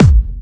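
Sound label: BD Clubby, bass drum; VEC1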